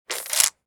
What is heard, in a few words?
Hair gel bottle squeeze